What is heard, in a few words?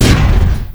pontoon impact hard, airboat; vehicles; Half Life Model Pack